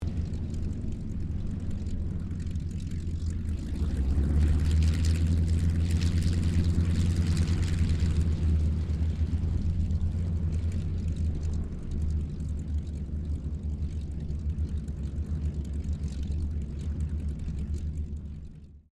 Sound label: AIRCRAFT CAC WIRRAWAY TAXI STEREO; CA-16 Wirraway; Airplane; Airplanes; Vehicles